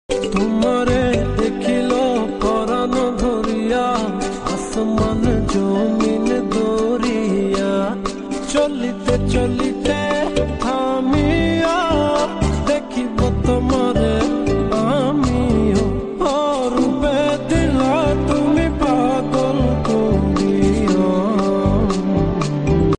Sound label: bengali